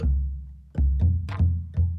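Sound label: Calm Far, music; from dust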